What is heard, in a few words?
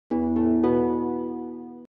sound university